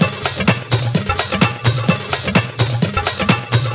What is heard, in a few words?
Latin House Loop 128 BPM; Vengeance Samples